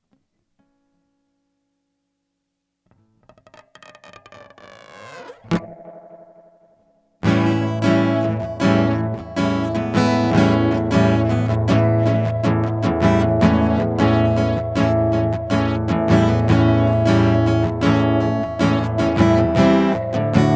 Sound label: Instrumental; hyper terrestrial